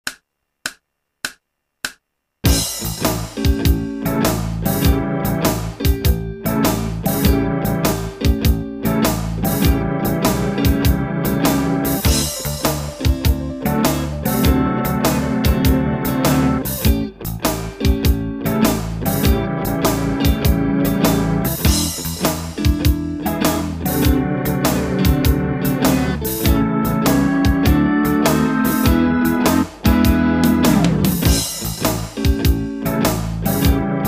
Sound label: Blues Etude Backing Track A